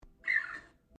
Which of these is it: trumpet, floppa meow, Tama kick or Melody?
floppa meow